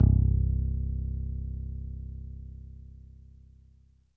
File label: ELEC BASS; Zero G; Man Machine